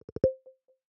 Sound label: Mail Scheduled